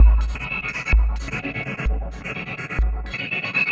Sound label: Arp Drum Shuffle; Sonic Foundry (Sony Creative Software); Ma Ja Le Ethereal Textures